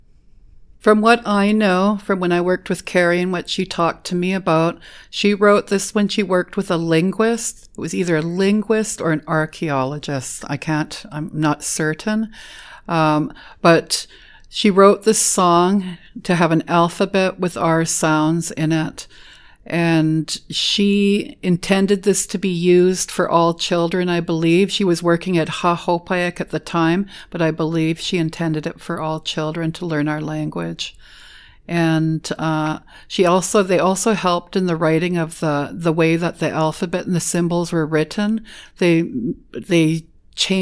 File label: alphabet song (background)